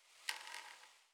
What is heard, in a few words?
wood strain, Night; FontainebleauDemo